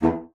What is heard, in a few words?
bassoon E2; Xsample VOL-6; C BASSOON